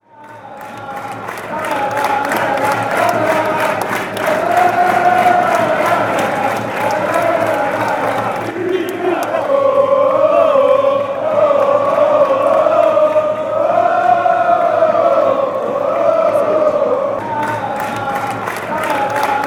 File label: milan lalala